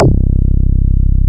TX BASS C2, SYNTH; AKAI S-Series CD-ROM Sound Library VOL-2

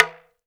TALK DRM, Heart of Africa; Spectrasonics

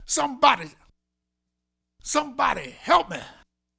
Vocal, 70s Analog Funk

